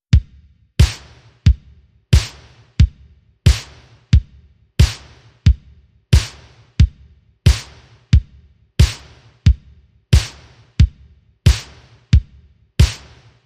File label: Drums (No Atmo)